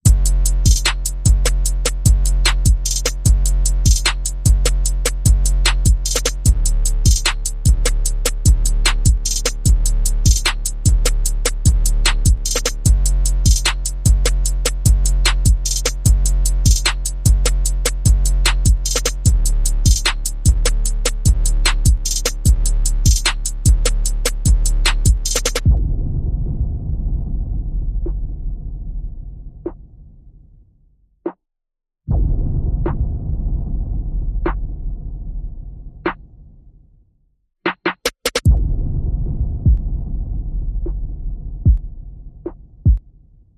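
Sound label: bass and percussion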